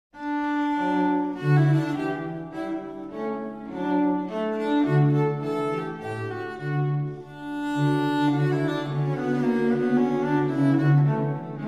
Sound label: Menuet